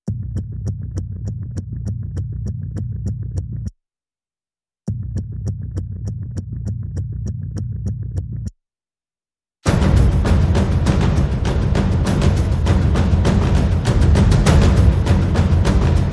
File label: Percussion and FX